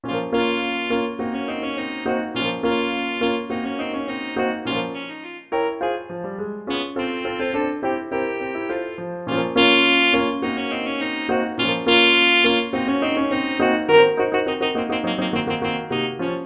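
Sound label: alto saxophone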